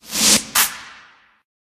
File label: wind up, notifications; Sony; Xperia Z3